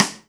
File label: Rim Rim Open Acoustic; Colombo Acoustic